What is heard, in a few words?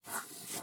Crayon Door-Open Rev; viewfinder